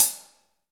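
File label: HH, hi-hat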